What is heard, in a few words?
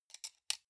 sunglasses plastic side piece fold rattle